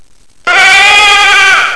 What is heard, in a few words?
shout